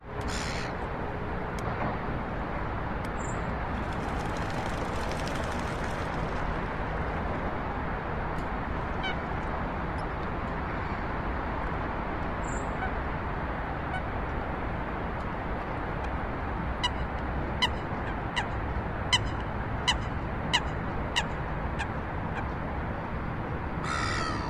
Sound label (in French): mouettes